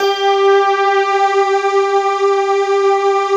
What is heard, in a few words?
D50 Stak, Roland